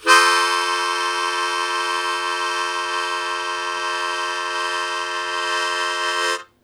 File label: Blues Chord Attack F1, Harmonica; 90 s Sample CDs; Zero G